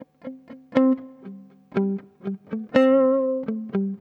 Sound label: Single note, jazz